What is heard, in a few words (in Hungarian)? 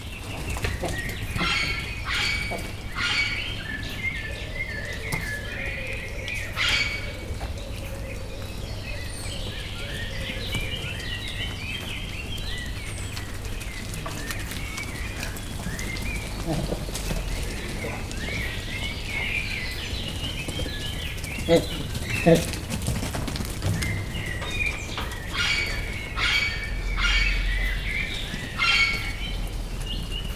kergetőznek, galléros pávián; pecsi zoo 2017